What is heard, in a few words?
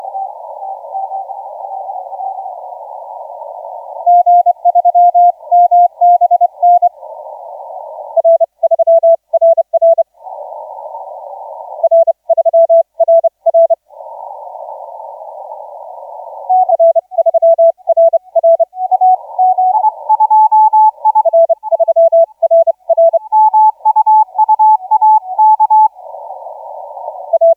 17m